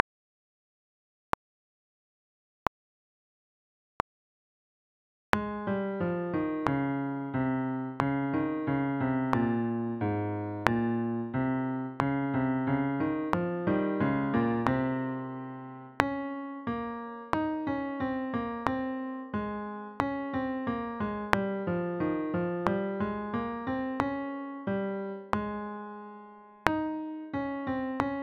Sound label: a flat scale study 45 bpm; Concert Band; A Flat Scale Study, Page 22 of Artistry of Fundamentals; Selkirk Senior Concert Band Repertoire